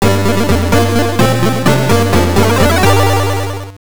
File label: Level Clear; Lucky Luke; gbc